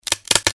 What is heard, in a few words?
wood short breaks